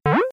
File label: phaser Down; kenney digitalaudio